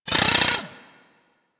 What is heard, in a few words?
Drill Punch; Warframe; Grineer Ghouls; Ghoul Drill Sergeant; Attacks